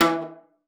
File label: E3, VIOLA PIZZ; Xsample VOL-5; 90 s Sample CDs